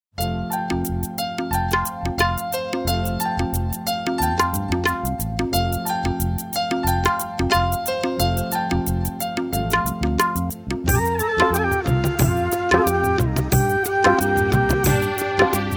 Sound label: Karaoke Music